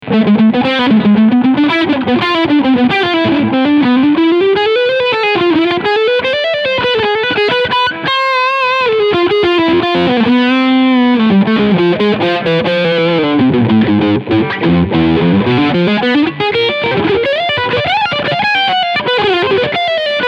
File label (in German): Gibson Les Paul Standard 60s Leadsound Steg Humbucker